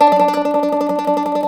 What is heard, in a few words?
MANDOLIN, Tremol Mandolin; StTremolMandolin